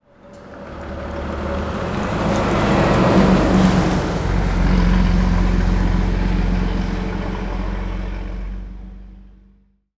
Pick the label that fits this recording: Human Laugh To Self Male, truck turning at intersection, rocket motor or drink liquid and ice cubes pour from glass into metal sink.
truck turning at intersection